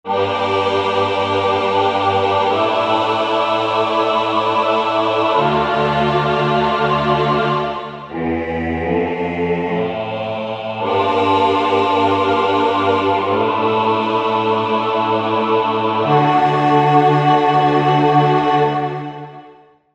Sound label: Slow Choir